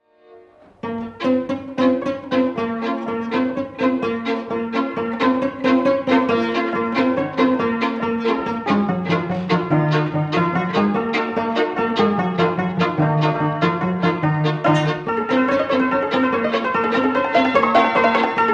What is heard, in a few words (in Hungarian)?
Dudanóta; Sopron